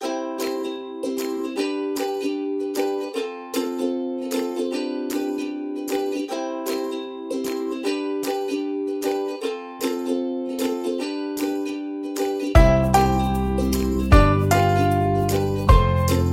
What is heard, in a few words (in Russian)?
muzyka dlya instagram